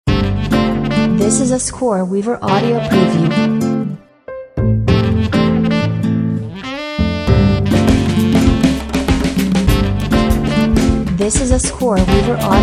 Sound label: jazz sax full length